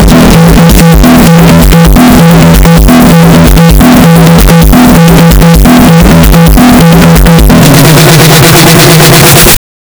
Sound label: energetic beat